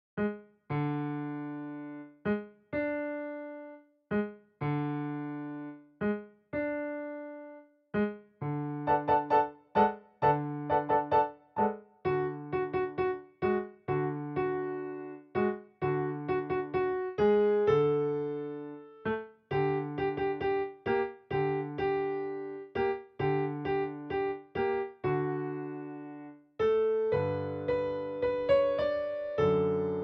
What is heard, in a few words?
Melody